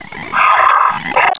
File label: zombine alert; scmod